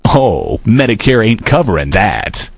die; postal dude

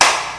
spank

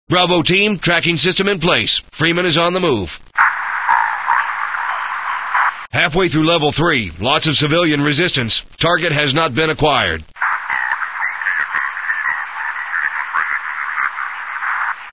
grunt; sven; dialogs

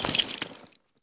draw, wpn

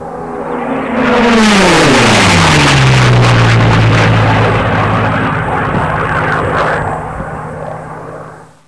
flyby

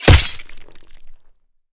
hit, knife; cof; guns

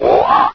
race idle

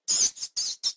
rat pain

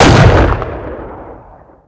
sniper fire; weapons; unreal2

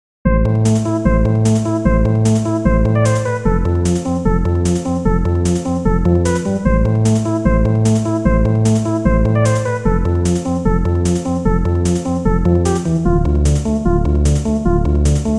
keygen song; sven

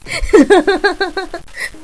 cheer

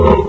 attack; sven; npcs; cthentaclhu

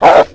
pain, perro

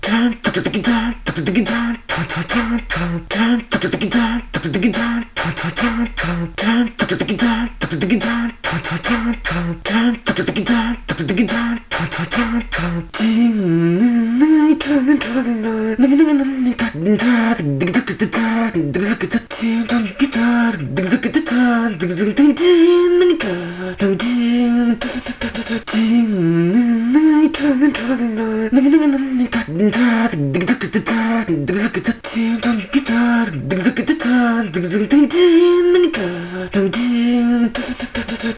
fight

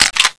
mk22 mag in, weapons

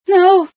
pain, female sci

